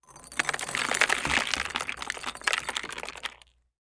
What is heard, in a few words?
Sand Pebbles; TOOLKIT vol.2